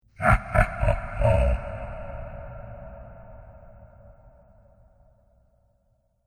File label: Evil Laughter From The Monster King; Andrew Fleming